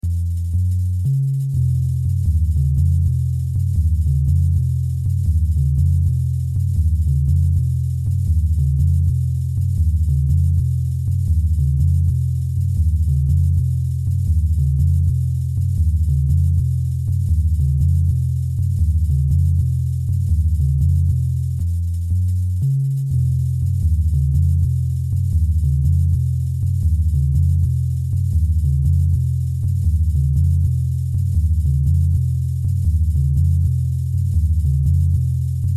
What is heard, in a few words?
tic tac